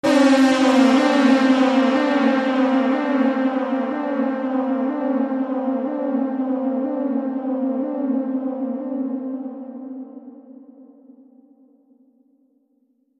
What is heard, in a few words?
Siren Echo